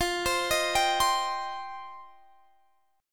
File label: F 7th Suspended 2nd F down Guitar Drop D